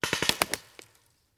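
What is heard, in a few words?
Paintball Gun Impact; Sound Ideas; Guns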